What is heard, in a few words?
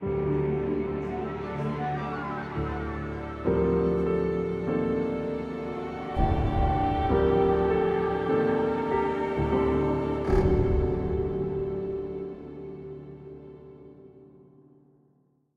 String; Middle-earth Shadow of Mordor